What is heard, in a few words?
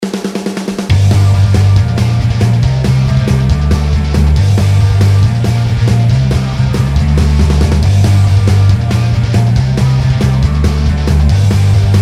battle normal; Neon; Fun games; boxcat games